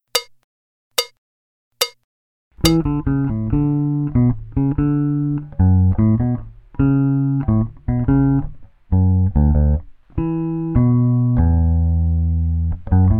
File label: Funk; Jazz Harmonia